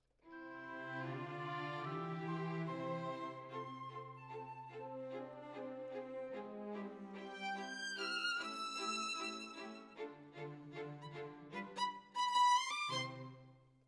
Violin Solo

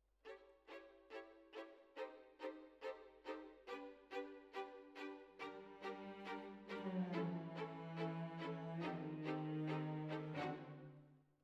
Violins Over

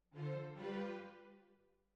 Cbasses

